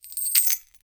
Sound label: equip chainmail torso, armor